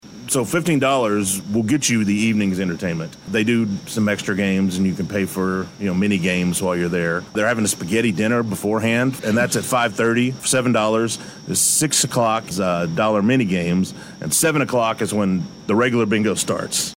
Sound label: CHOIR; BINGO; BSH CHOIR